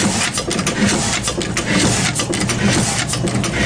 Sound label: blackstone oil engine loop, 1909 10HP Blackstone Oil Engine; DRUM LOOPS and BREAKS